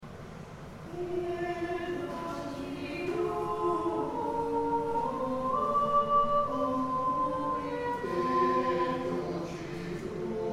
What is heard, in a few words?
In dulci, carols; In dulci jubilo; Crook Carols 2023